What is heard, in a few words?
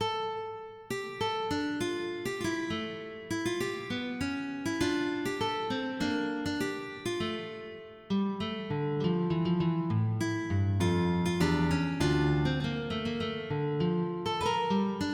Gigue